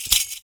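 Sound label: PERC, percussions; Univers Sons - Raricussions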